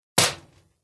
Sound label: Metal Door; granny chapter two; android; mobile